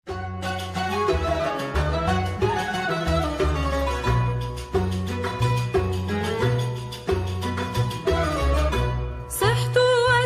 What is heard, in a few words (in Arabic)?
موشح Andalusian Arabic